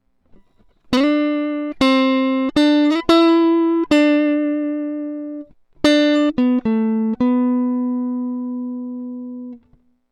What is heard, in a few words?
e. gtr